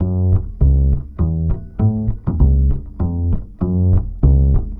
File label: BASS, Balkanish Traditions